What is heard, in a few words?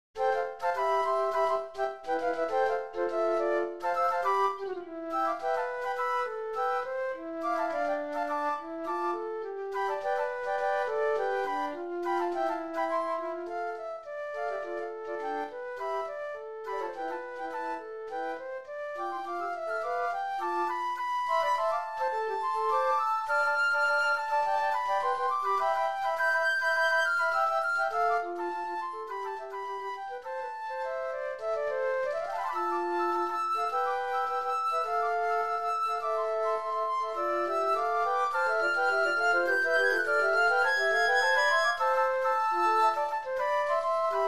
Flutes